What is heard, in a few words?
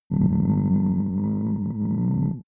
stomach growl; soundpool